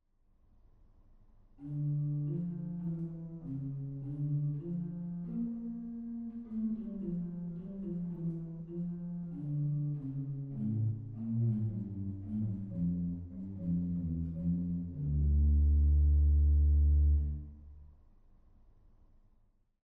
Octavbass 16; goenn

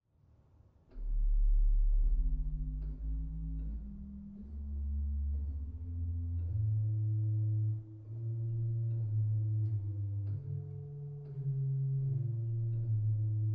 Subbass 16, Sauer; dortmund

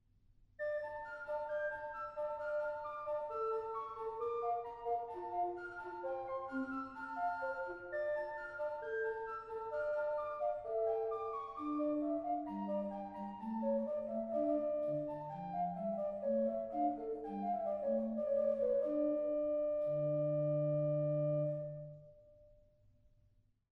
Floet; stade

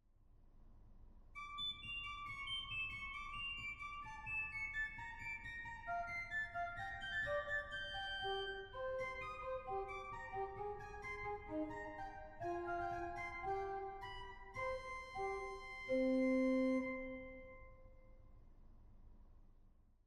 Flageolet 2; 1844 Franz Xaver Engelfried Organ; goenn

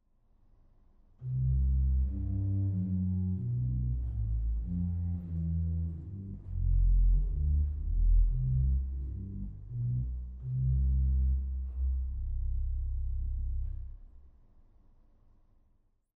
Principalbass 16; goenn

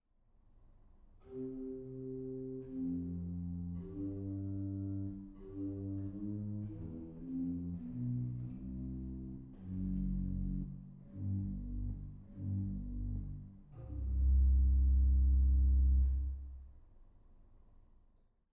Violonbass 16; goenn

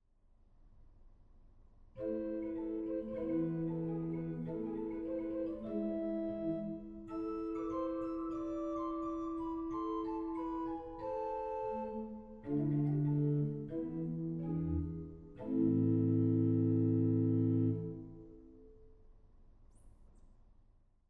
Flauto 8; goenn

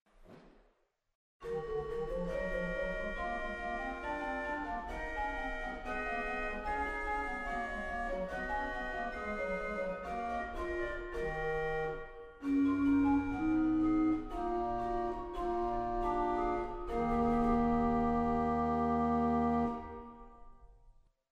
Qvintadena8; waltershausen

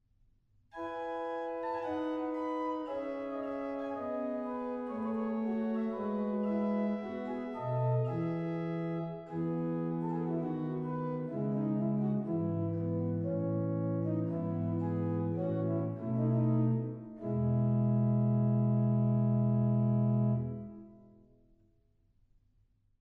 Principal 8, organ; stade

